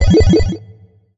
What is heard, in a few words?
combo success; dota; crownfall